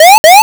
warning

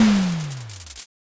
firework burst

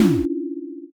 shock don

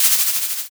eraser

